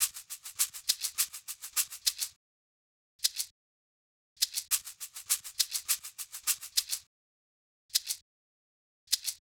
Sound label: Percussion C, Emin